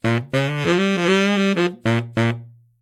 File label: sax bari lick